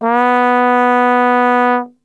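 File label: B 2 trombone glissando, Brass Super Section; Best Service; TRMB GLISS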